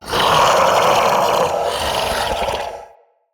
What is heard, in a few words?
Male Vocal Breath Heavy, Enemy; Mockery; Mockeries